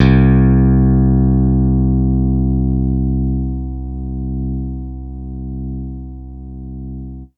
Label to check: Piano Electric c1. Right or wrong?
Right